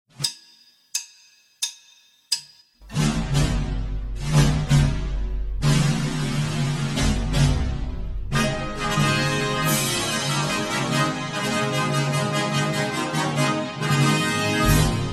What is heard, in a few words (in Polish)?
fanfara